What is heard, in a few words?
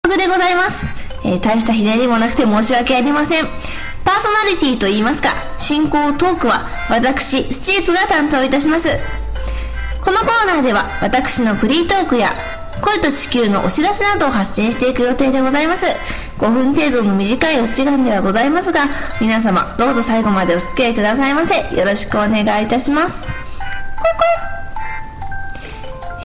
house music